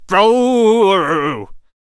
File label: Gunslinger Attack Vocals